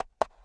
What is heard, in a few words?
step